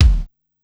Ambi Kick, Korg Wavestation-SR, Drum Kit; Wave 455 - Drum Kit

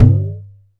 HAND DRUM

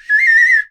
bird calls, percussion; Voice Crystal - Steve Reid Definitive Percussion

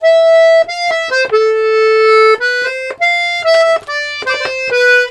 Accordions; USB Soundscan vol.40; AKAI

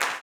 CLAP, blues & soul; ILIO; Vocal Planet

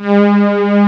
ANALOG PAD; East West; Global Sounds

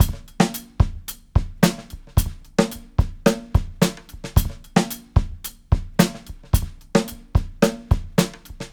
Live Beats, R&B Drums

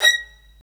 STR, STRINGS; Roland - LA Composer vol. 1

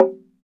Slit Drums, Africa, Wood & Metal

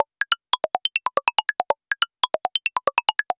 Anabeat Perc; Sonic Foundry (Sony Creative Software); Prototechno

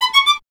STR VLN, Solo Instruments; Vln Misc; Miroslav Vitous

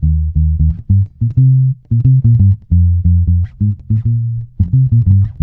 D#, dub, bass; Spectrasonics; Bass Legends CD 1; JOY DUB

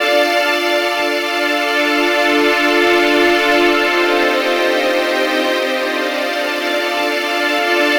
Pad, Trance

